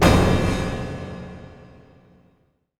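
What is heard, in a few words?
crash, cymbals, industrial loops; USB Soundscan vol.24; AKAI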